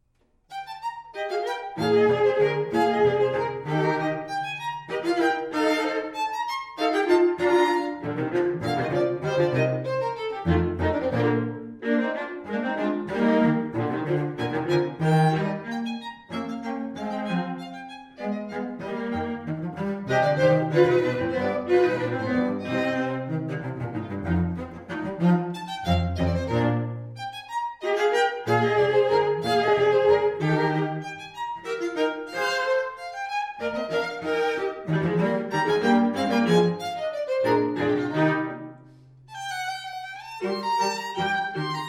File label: trio